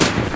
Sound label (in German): umwerfen; SkiAlpin2005